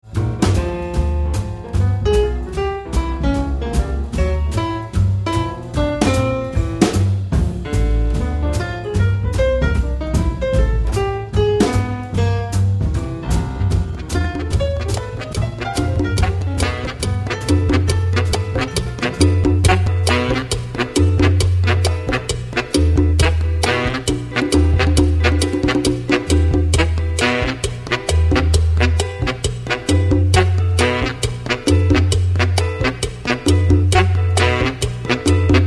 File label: Jazz To Salsa